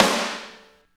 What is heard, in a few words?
SNR, Tekno Industrial; East West